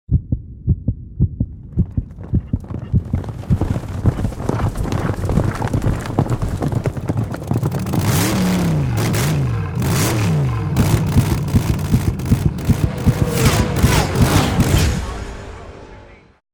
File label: RINGTONE; FASTKART MUSCLE